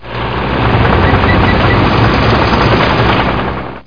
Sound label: tanks; MPG-Net (USA); garden